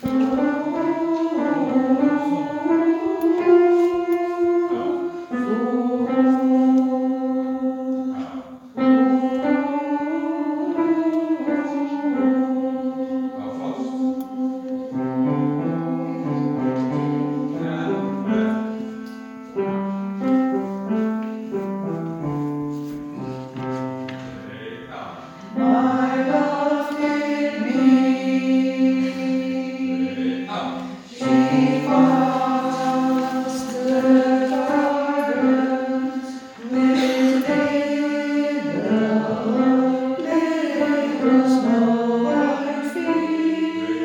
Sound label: The Sally Garden Alt